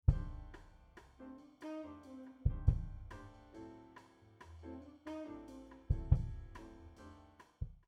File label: Kick Mix Proc HPF